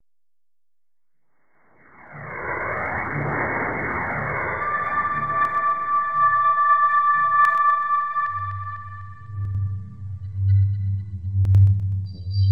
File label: Db Diminished 60, Deconstruction